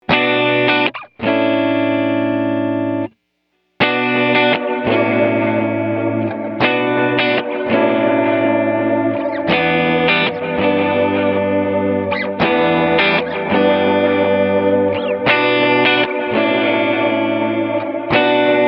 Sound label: magneto; bigsky